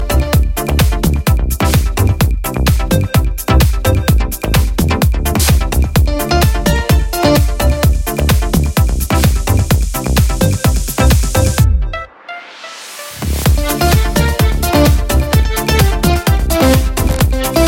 Lezginka

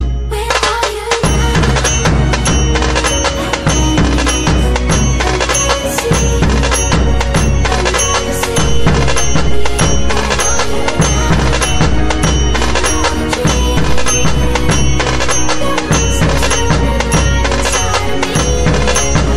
Dhol Tasha

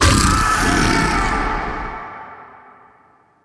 Howl; Excalibur Umbra

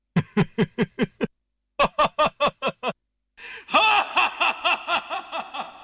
Laugh; Shin Iori

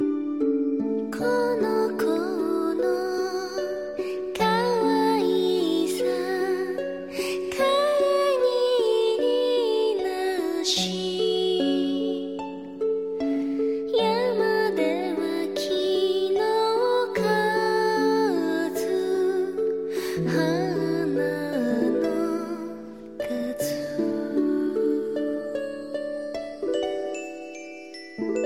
Lullaby; Okinus

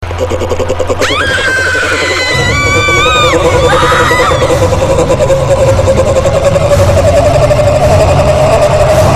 Horor; 1920

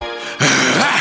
grrr

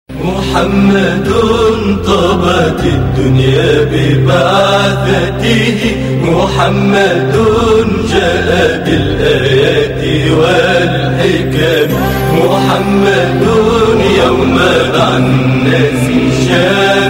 Islamic

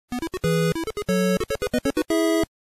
Level up tetris

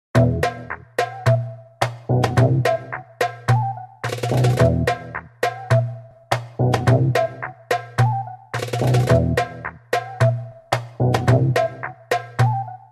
Oriental Ringtone

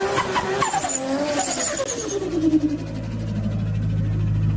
Supra turbo flutter

